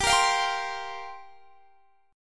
G Minor 7th Add 11th; Gm7add11; strummed

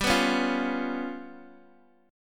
G 7th Flat 5th, Guitar, Open D

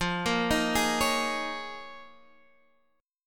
D sharp 7th Suspended 2nd Suspended 4th F, Guitar

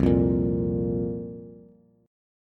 C 7th Suspended 4th, Bass; C7sus4 strummed; C7sus4 chord

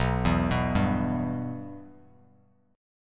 B Suspended 4th Sharp 5th B Bass Standard; Bsus4#5 arpeggiated; JGuitar

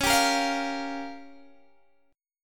Db 6th Flat 5th, Guitar, Open G

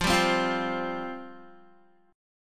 F Suspended 2nd F Guitar; Fsus2 strummed; JGuitar

